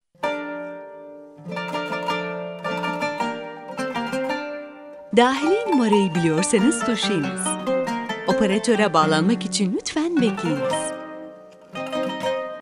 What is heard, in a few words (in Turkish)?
santral fon muzigi